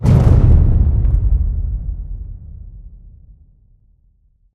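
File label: Explosion Rumble Exterior Distant Punch; Boomtown; Titanfall 2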